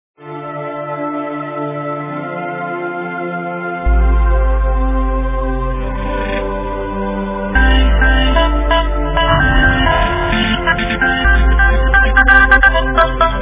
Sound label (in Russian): rington